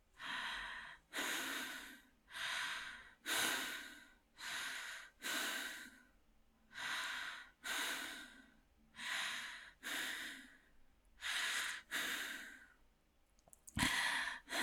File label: Out Of Breath Woman Mid